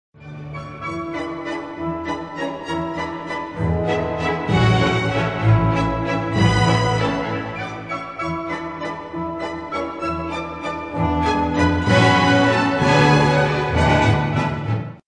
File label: Music In Style